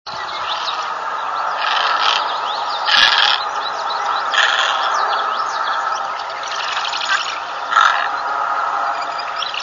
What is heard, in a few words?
great egret with wrens